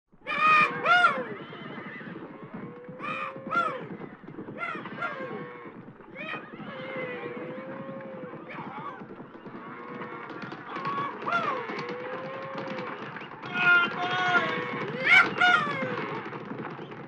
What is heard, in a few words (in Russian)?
pogonshhiki stada